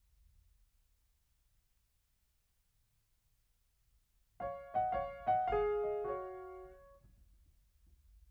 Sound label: Piano Mono